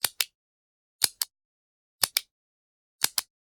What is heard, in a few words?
Generic Button Plastic Small